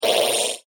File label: Two Tone Laser Blast; Laser Gun Sound Effects; science fiction sound effect; Andrew Fleming